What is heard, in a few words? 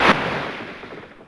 Sand